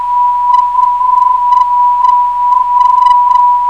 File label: 427kHz, ndb; FER